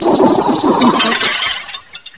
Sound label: die; hunger; babyslave